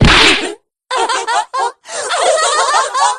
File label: laugh; p4u; shadow aigis